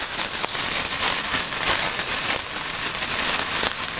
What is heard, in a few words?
Fuse; CastleMiner Z; Xbox 360